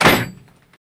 Boat Door Close Rattl; boat doors

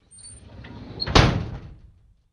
Drawer Metal Close; drawers

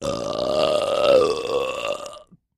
Lrg Two Toned Belch; belching

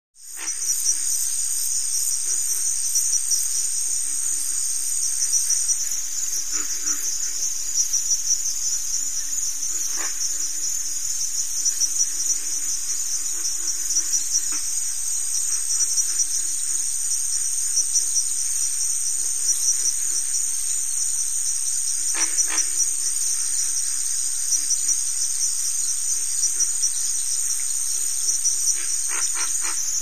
Cricket Ratchet Cont, crickets

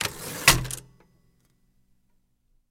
Typewriter Carriag; typewriters

